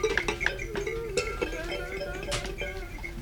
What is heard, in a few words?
Tamborine loop